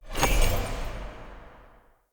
UI Camel Vendor Open

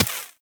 Laser Impact Generic; Warframe

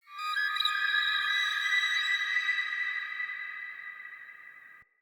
Day Texture, Ambience

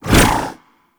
Melee Long Whoosh

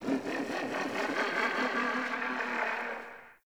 Venus Landscape Mid Creatures, Ambience; Warframe; Venus Open World

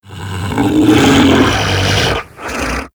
Infested Juggernaut Vocal Idle; Warframe

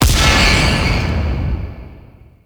Queens Chamber Braid Destroy; Warframe; The War Within

